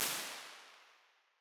Full-Size Auditorium - solid early reflections and long tail